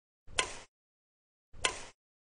打羽毛球声 接住

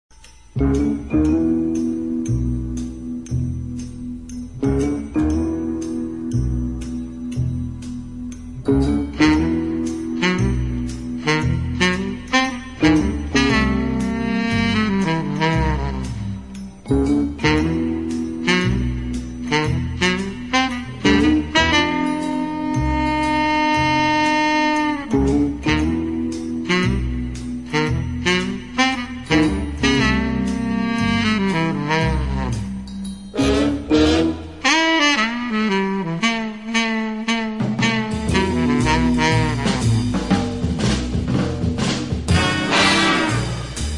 喜剧的搞笑背景音效

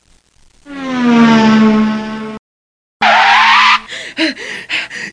烧胎; 汽车音效

